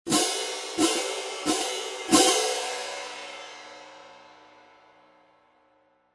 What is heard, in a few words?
18in Z MAC Pair, cymbal sound